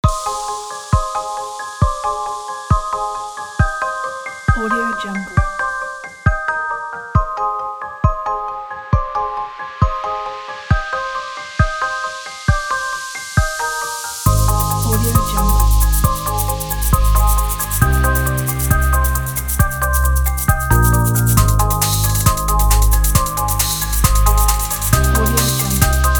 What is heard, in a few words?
driving upbeat